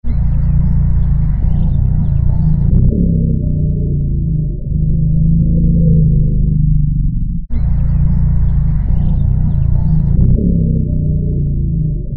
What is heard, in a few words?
rumble alarm; Saburu